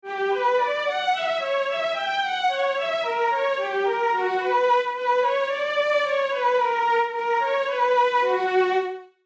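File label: B minor 120 bpm, Classical MIDI Melody; Ballast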